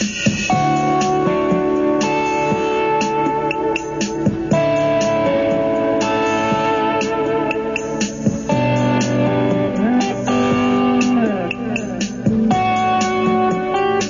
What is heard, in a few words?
happy song